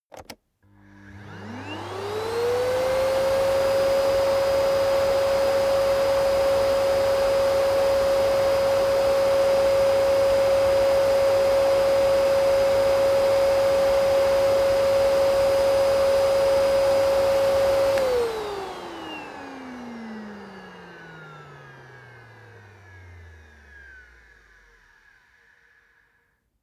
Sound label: vacuum high